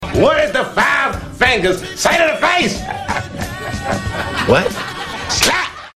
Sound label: Slap